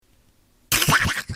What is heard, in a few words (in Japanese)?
硬いものを落とす